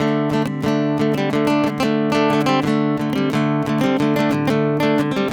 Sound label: 90 Dm guitar dry; Agist